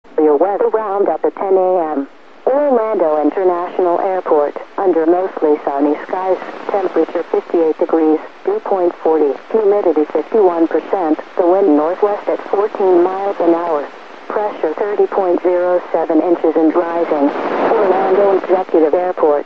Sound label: 33.420MHz